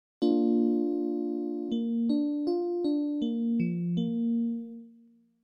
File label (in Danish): skala b es